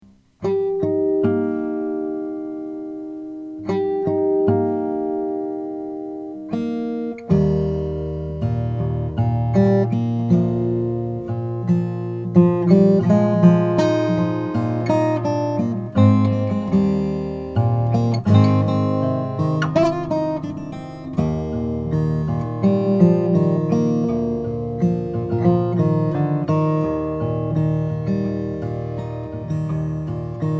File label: Froggy Bottom baritone madagascar